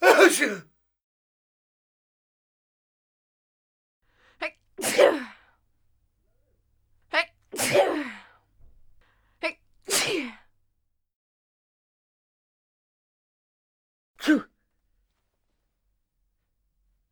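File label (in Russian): чихают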